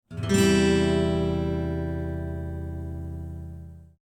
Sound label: Guitar